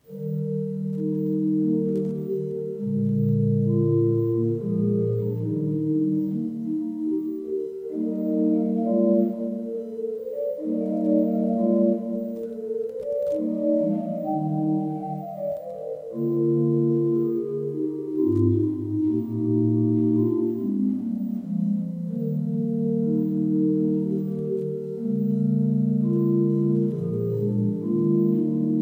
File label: bourdon 8